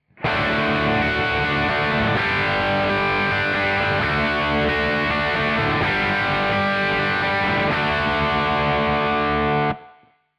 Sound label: guitar drive